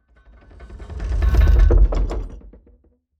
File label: turnstile stop; fallguys